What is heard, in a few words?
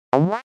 Arcade 8 bit jump